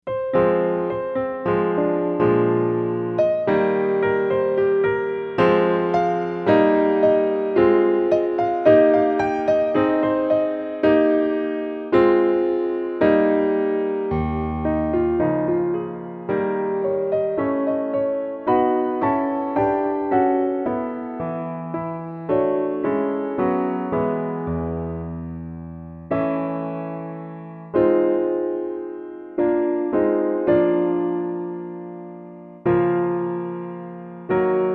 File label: PIANO Acc HIGH